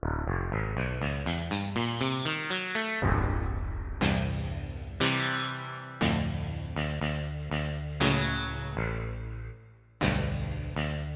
Electric Sitar

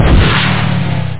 shrinker; PC Gamer IT CD 15; duke3d

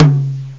tom drum; drums

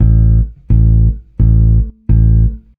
simple, Rock Bass Loops; ACID MUSIC

